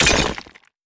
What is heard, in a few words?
win Refill; netent